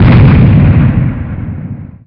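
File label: explosion; ragemap2023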